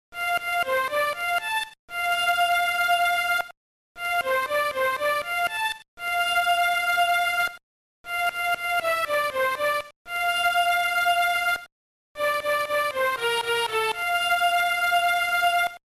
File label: Violin; WWTwisted; Mona Pizza